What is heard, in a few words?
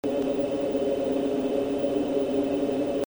noise